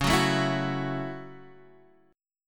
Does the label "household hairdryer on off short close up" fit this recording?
No